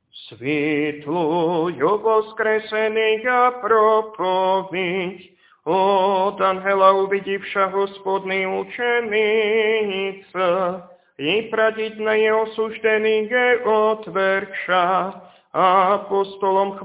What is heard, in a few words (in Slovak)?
Tropár voskresný hlas 4; nedelna-skola